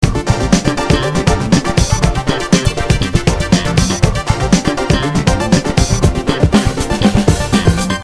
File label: loop